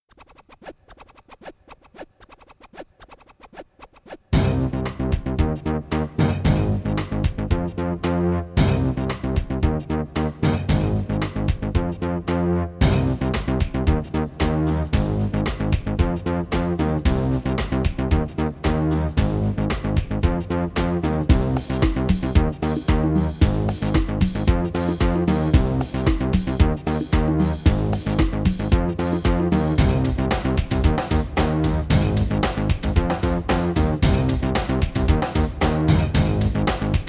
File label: backing